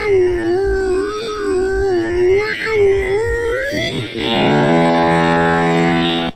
brain fart 0 25x speed

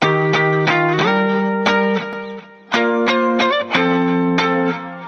straight to heaven meme

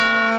cartoon hammer

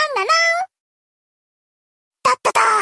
nan na na dad da da

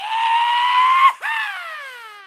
goofy yeha yeehaa yeeha yehaa

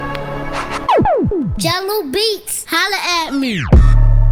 jungle beats holla at me

